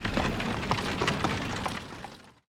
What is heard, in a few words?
carriage go away